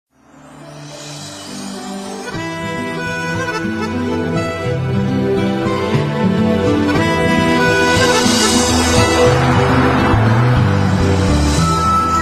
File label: violin